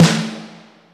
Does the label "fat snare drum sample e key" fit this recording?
Yes